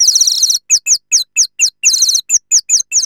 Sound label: nightingale, whistle